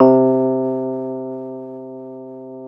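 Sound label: AMP FENDER C3, Keyboards Old School; USB Soundscan vol.09; AKAI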